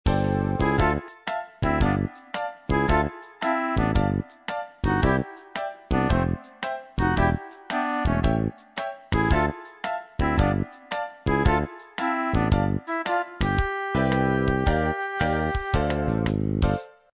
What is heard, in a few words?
shop Sound; habilidade